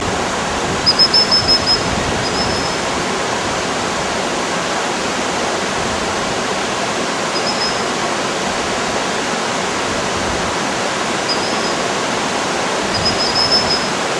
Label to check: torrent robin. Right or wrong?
Right